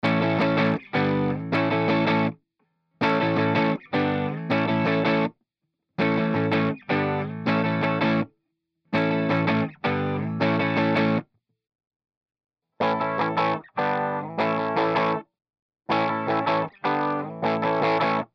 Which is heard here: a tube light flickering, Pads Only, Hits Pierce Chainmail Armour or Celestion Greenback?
Celestion Greenback